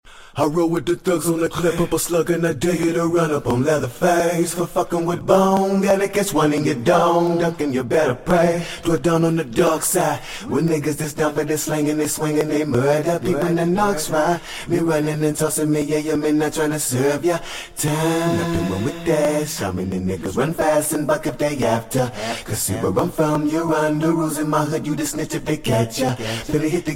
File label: Acapella